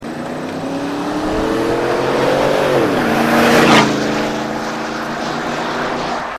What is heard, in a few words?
avalanche sport exterior drive by, exhaust systems; corsa